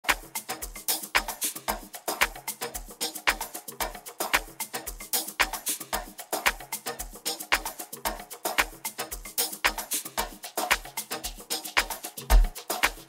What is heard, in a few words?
Amapiano Mix; October